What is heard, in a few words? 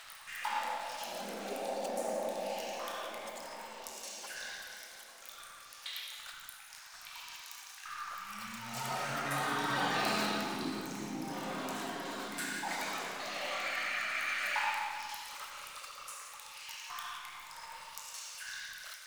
Cave Descent Distant Sentients; Warframe; Veilbreaker; Kahl